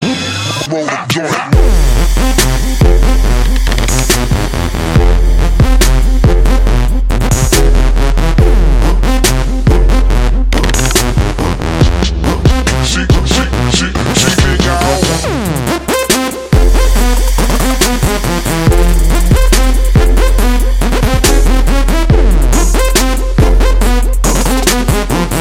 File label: Trap Music